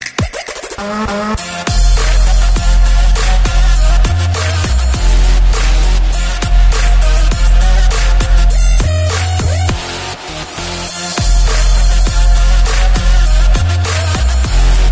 arabian trap

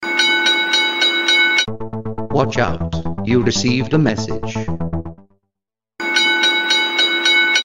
Watchout Message

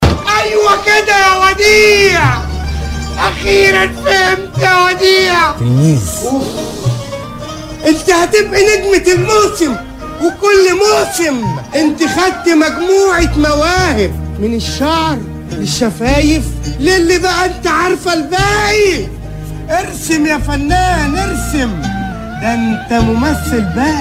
Melody

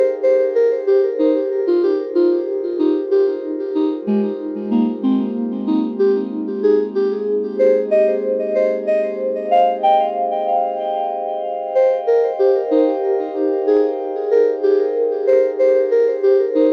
Relaxing Tone

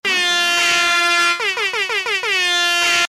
Msg Tone

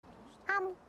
Nom

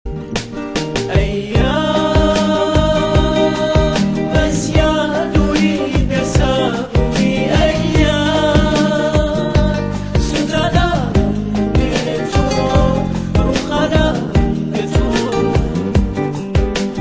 Slow

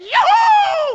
Shout Yahoo

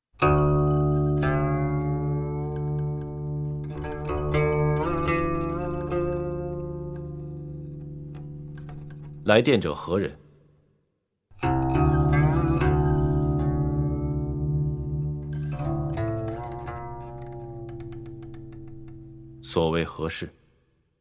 guqin; Lan Zhan

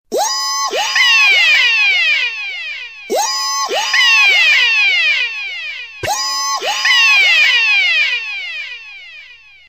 Message

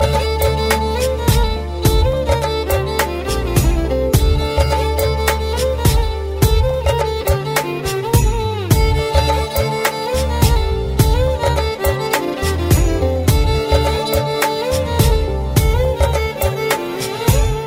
Cute Violine

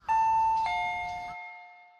Anime Doorbell

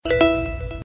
Message; Eyephone

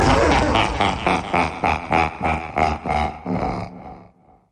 Evil Laugh Sms

